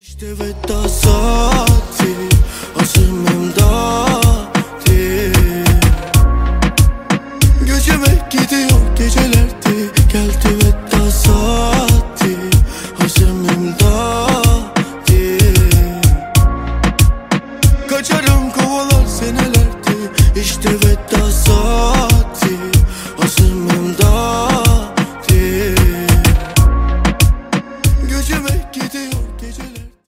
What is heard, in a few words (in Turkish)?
Rap HipHop Zil Sesleri